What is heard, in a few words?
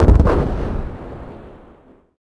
shield; NanoFXv2; ExplosionFX; ExpShield